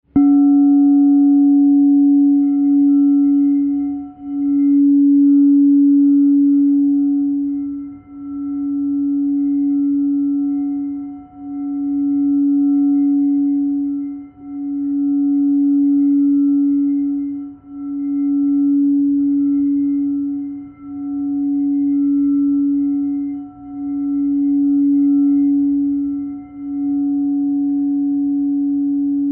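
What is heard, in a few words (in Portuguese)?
FOSCA Cs4, quartzo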